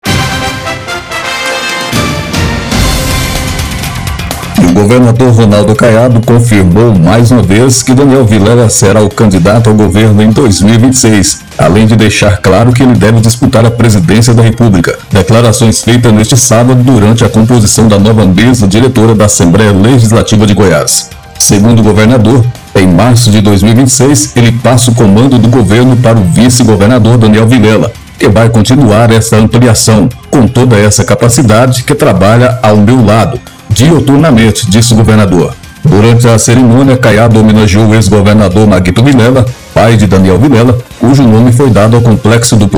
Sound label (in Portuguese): VINHETA